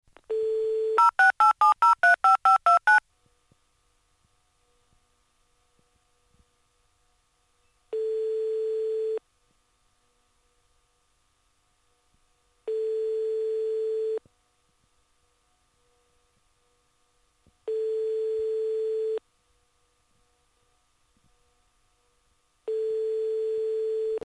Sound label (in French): tonalite telephone